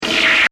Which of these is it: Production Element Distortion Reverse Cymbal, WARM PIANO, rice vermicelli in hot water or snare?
Production Element Distortion Reverse Cymbal